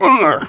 Beats; Submarine

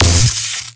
MagicItem Sparx Shoot

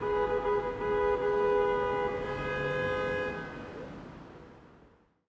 Ambience Car Horn; Subway Surfers City; Android, iOS

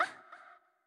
Hurt; Winkubus; Going Under

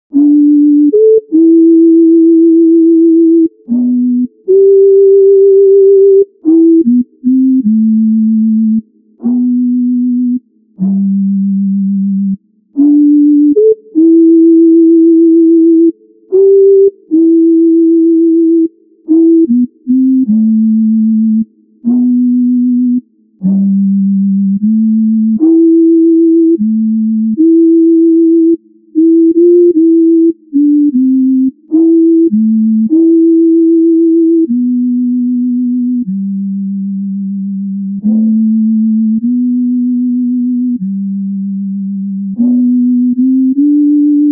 Rainless (Hum); Easy Delivery Co. (2025)

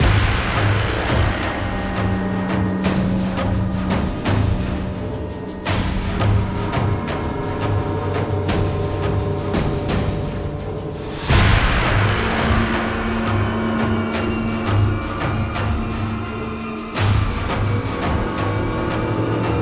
Base Loop low

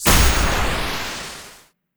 Union Smash Shot

